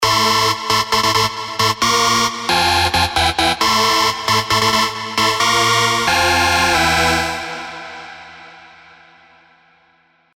rave stabs; Enclave; revealed spire rave stabs

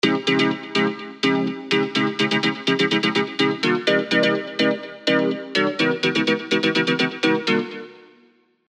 serum 2 house stabs; Addicted To Trouble; alonso saloon serum 2 house stabs

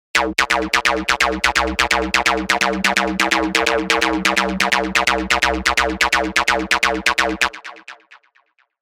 Repeat, 303 sequences; Revealed Spire 303 Sequences Vol. 3

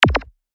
A#, percussion; revealed percussion vol 1

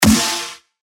Snare; Sponsor; revealed claps snares vol 2

